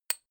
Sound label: click glass generic, signale